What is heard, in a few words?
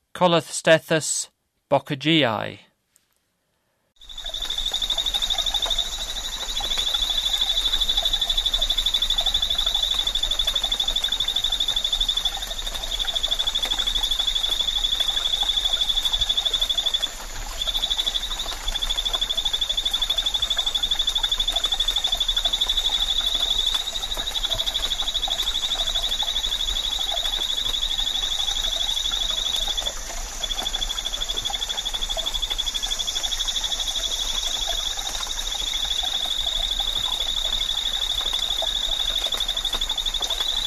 Colostethus bocagei, Frogs of Ecuadorian Amazon; S. America